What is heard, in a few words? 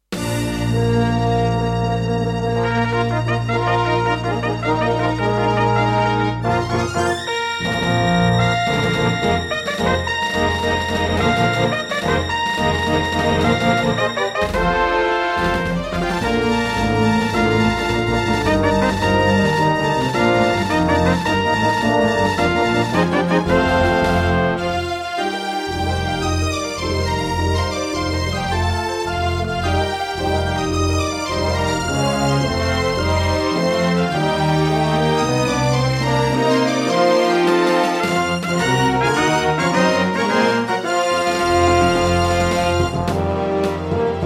Intro, Roland SC-88 Pro 88MAP; Dark Forces